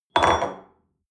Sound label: espresso upset table soft, restaurant cafe; sport-freizeit